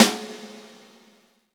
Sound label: SNR, Reverbs; Club-50 - Foundations Roland; Verb 7